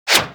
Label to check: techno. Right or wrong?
Wrong